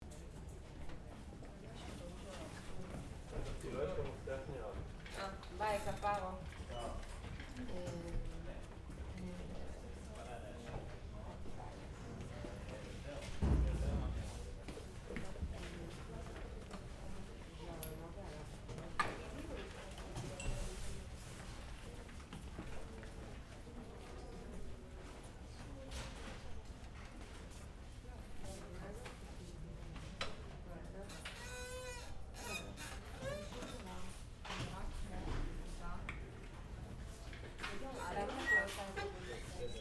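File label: School corridor empty murmur Hebrew; atmosphere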